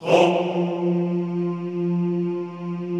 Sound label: HOMMM F2 R, Extended Classical Choir; Best Service